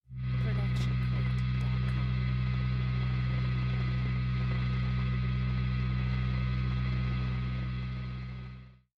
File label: lightsword humming; soundscrate